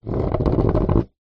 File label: sport surfboard scrape drag concrete ground fins